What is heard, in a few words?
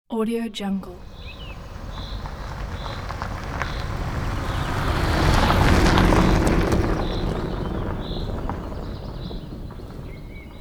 small car driveby through forest; fxprosound